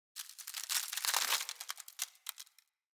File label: Amb Dead Tree Texture; Ambience; Mendicant Area